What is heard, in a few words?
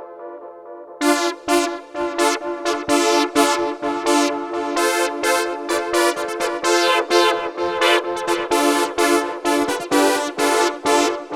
Brass